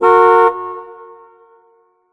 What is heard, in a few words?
Music Frontend